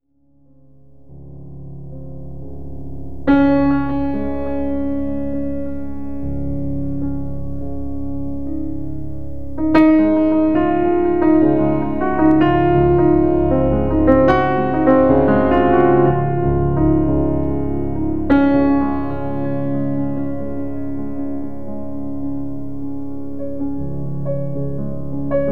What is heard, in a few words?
music